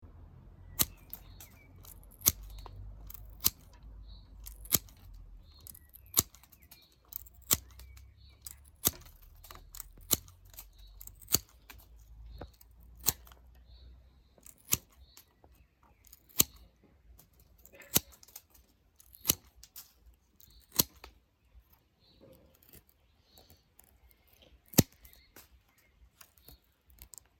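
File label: secator, garden pruning shears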